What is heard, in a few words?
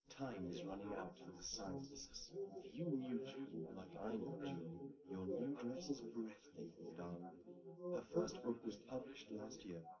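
male, live recording 250ms 1m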